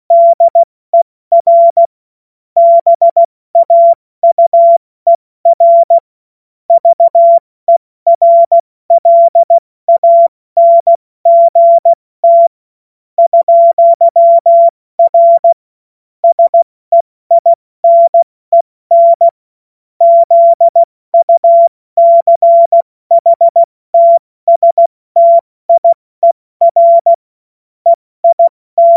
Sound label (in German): Witz, Morsekurs, cw morsen